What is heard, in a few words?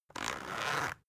zipper soft